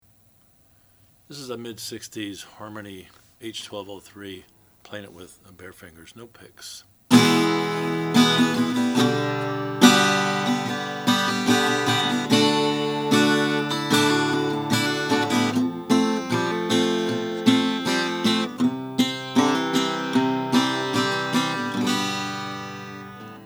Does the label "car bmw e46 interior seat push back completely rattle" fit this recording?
No